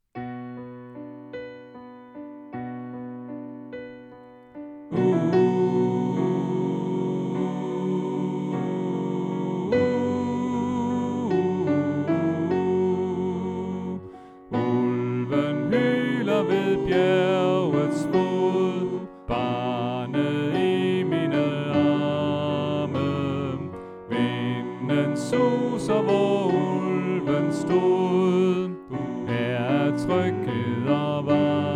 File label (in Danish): Sopran; oevefiler